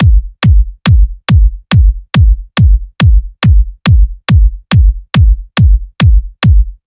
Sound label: Drum